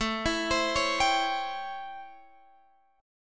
A 7th Sharp 9th A down Guitar Open G

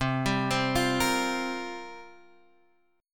C 7th Suspended 4th, guitar

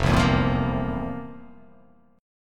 F Major 7th Suspended 4th; FM7sus4 strummed; JGuitar

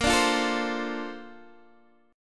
A sharp Major 9th, Guitar, DADGAD

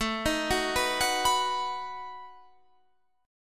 B-Major A

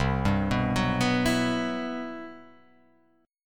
C Major Sharp 11th; CM#11 arpeggiated; JGuitar

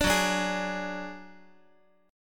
F sharp Suspended 2nd Sharp 5th, Guitar; strummed; down; 4A,4E,5A,5D,5Gsharp,6Csharp

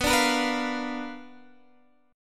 B Minor Major 7th Flat 5th Guitar Half Step Down

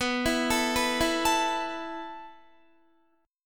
B 7th Suspended 4th B down Guitar; B7sus4; arpeggiated; 4E,4A,5D,5G,5C,6F

